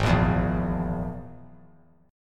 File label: G sharp Suspended 2nd Sharp 5th, chord; G#sus2#5; strummed; JGuitar